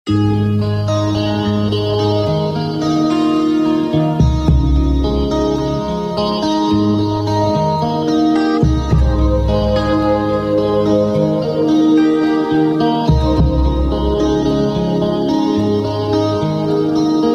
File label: Sweet Tone